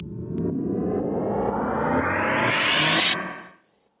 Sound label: reel long spin; games; Fenix Play 27 Deluxe; Wazdan